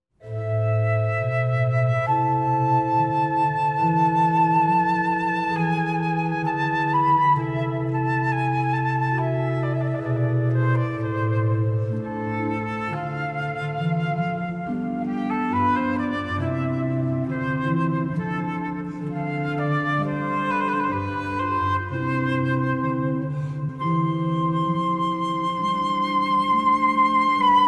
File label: Sonata for Flute and Organ in C major; Bach